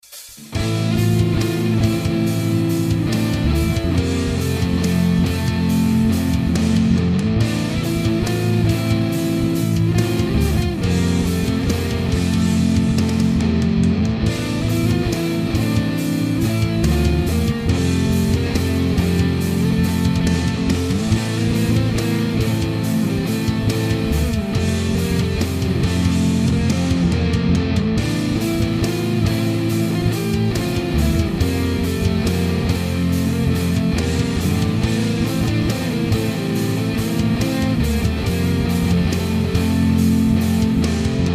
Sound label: instrumental